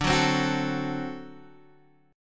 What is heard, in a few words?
D 7th, down, Guitar, Open F; strummed